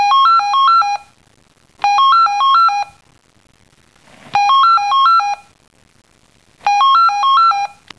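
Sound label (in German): Telefon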